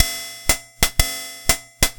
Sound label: Yamaha PSR-22 Swing